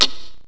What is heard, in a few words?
CLACK; ePhone